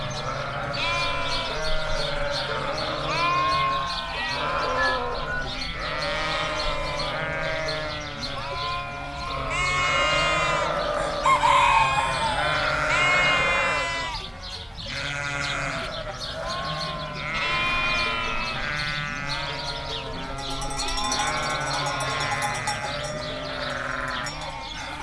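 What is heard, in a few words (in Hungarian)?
balra verebek kakas jobbra juhok; laszi tanya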